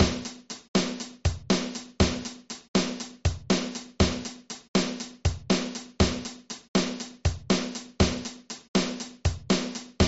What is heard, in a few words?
120BPM Drum Groove